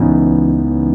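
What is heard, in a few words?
Electric Piano Chord; Digital Sound Factory Xtreme Lead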